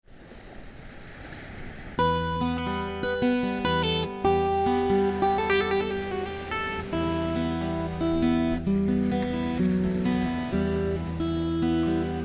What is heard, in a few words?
Beach Waves, in E-Moll; Instrumental Akustik; remix